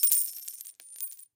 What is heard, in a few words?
coin win loop end; vegas night life